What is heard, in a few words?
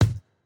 Jrock kick